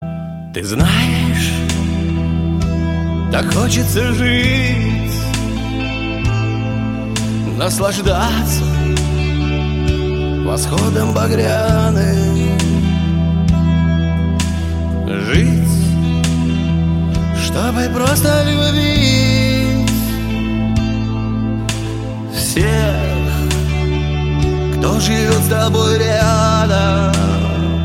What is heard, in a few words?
russian song